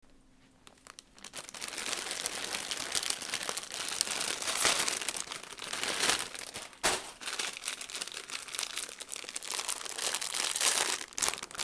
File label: snacking